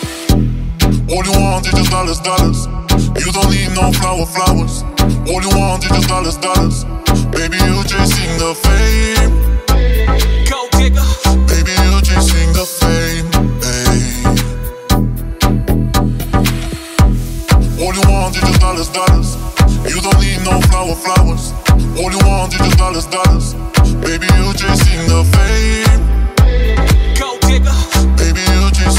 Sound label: dirt dance hits